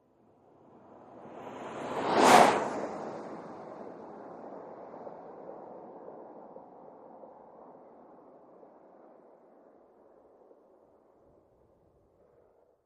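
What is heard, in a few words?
SUV By From 100mph Right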